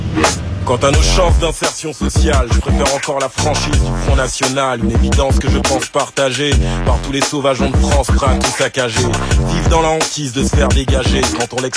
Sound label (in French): Chanson